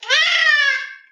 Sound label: 1 syllable annoyed cry 1846Hz, cat; sylvester the cat